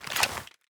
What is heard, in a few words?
inv drop; STALKER; IWP